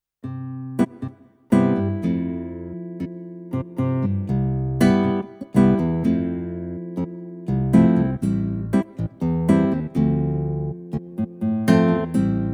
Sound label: S950 Guitar